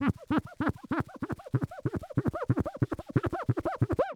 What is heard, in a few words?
Household Glass Cleaning With Paper Towel And Glass Cleaner Squeaking Long